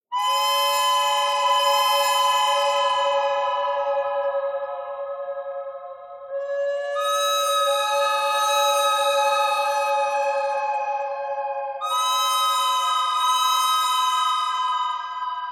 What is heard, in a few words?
bird thread pentatonic; noreverb